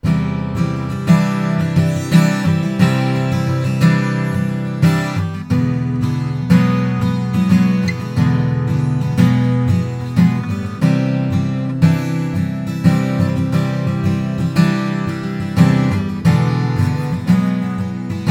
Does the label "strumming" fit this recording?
Yes